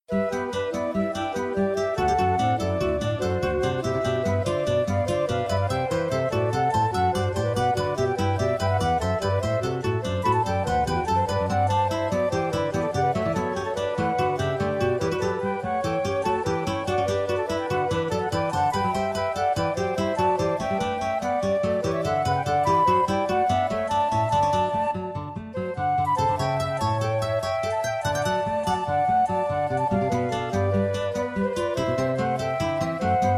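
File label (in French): inventions pour guitares flûtes; bach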